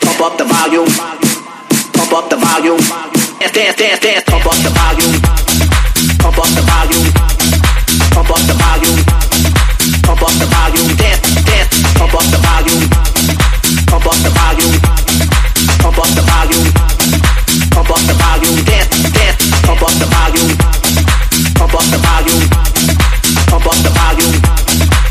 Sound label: Dim Chord Pump Up